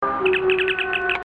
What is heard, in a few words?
Communicator Beeps (Old School); Star Trek